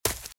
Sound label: Footsteps Leaves Sprint Right; RadwinIsland